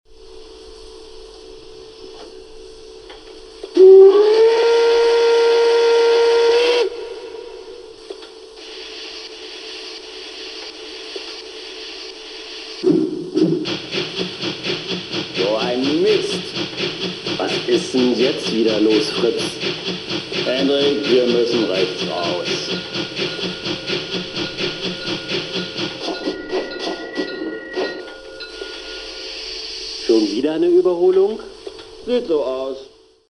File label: BR44, trains